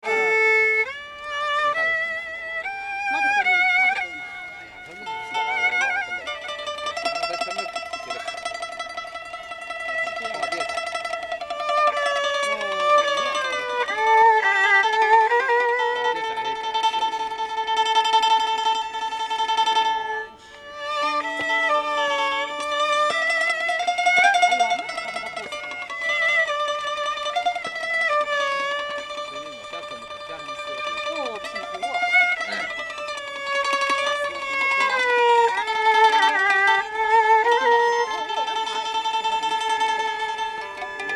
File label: instrumental; Instrumental duet